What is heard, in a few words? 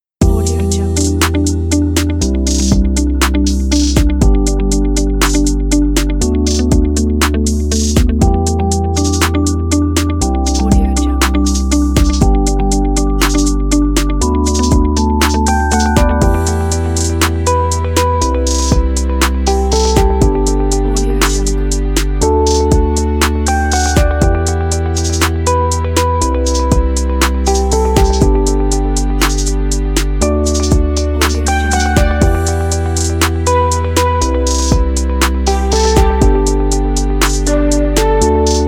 trap corporate